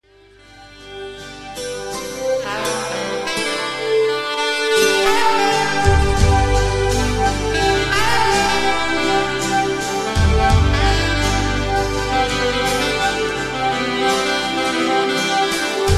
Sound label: Flying Sax